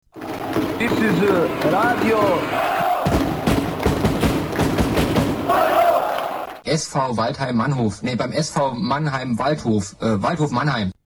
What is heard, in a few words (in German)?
jingle; doppelpass on air